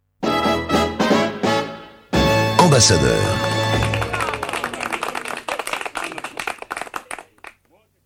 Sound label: jingle; dorval; supernana